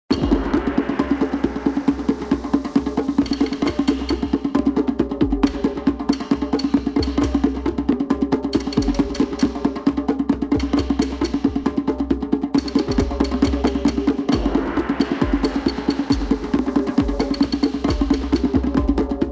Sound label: music